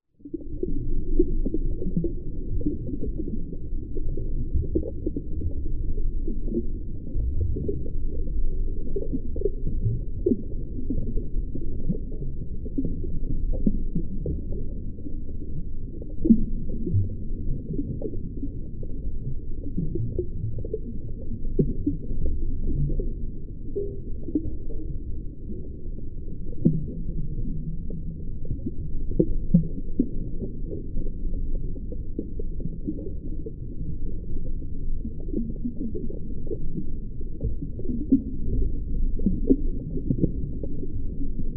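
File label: Ambience Underwater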